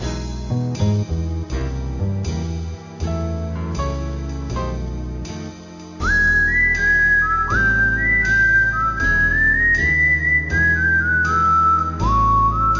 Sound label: Swinging Whistler's Dream